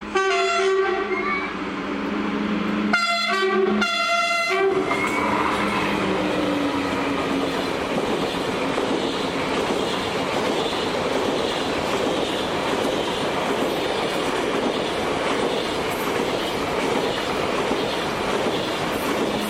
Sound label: TGV Ex-Eurostar Horn